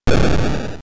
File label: Bite Attack